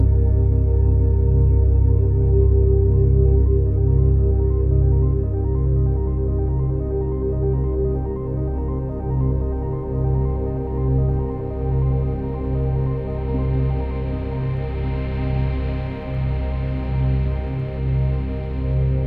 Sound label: ambience; Mirror's Edge (2008)